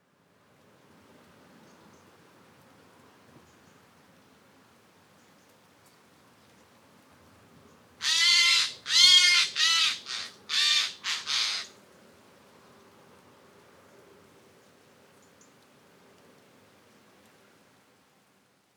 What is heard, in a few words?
Garrulus glandarius; SETE